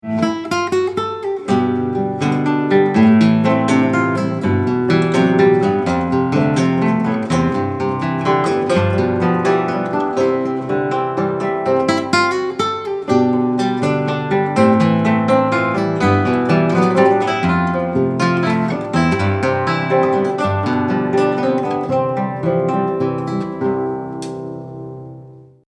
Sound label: Guitar; El Negrito